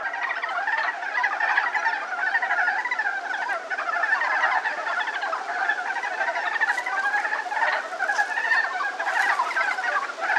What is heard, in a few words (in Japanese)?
ヤマアカガエル; キョロロキョロロ; 10sec; 森林総合研究所 多摩森林科学園